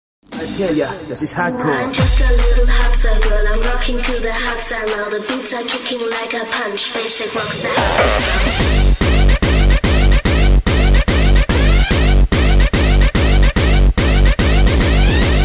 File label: Hard Style Dance